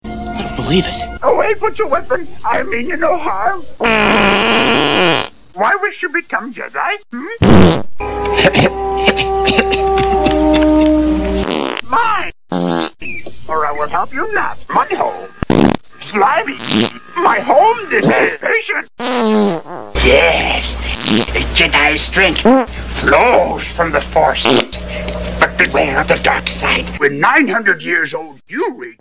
fart ringtone; yoda

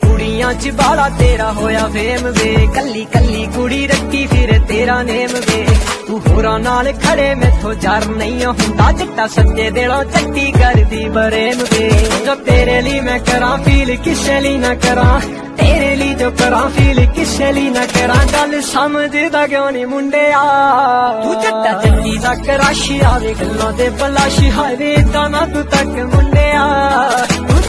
famous punjabi ringtone, bollywood

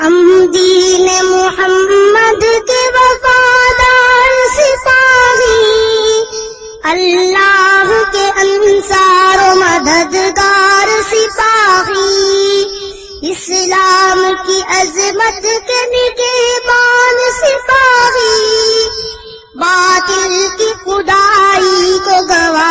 nasheeds

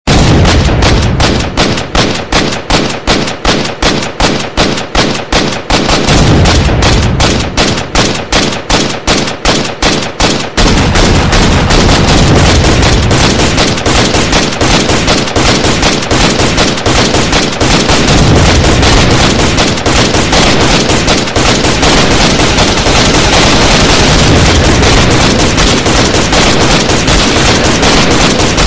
gun effect ringtone

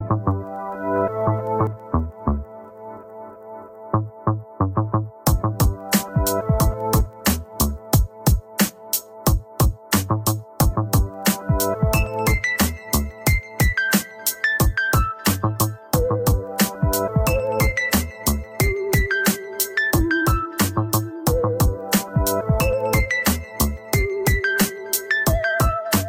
sony xperia nice tone ringtone

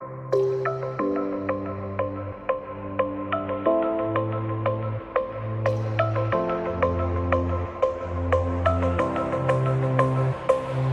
huawei honor a8 prime official ringtone

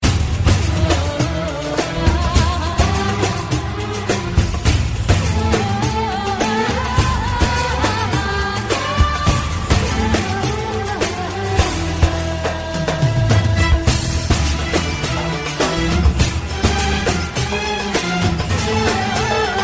persian arabian ringtone

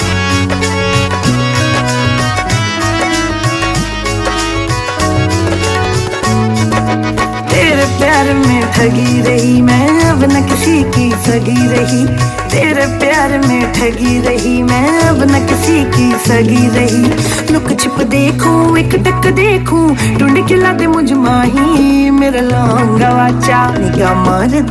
hindi ringtone, bollywood ringtones

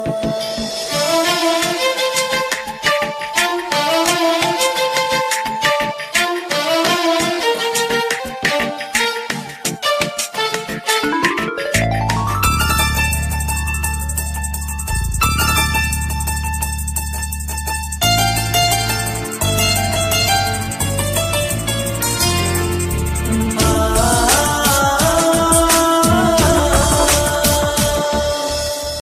new malayalam mappila love ringtone

